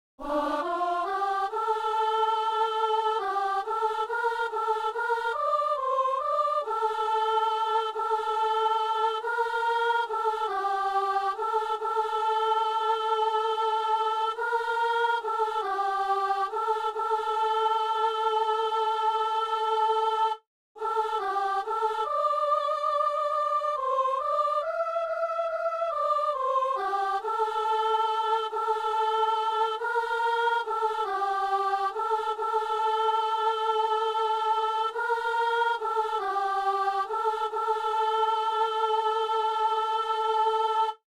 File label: soprano; Messe coutrillonne - Agnus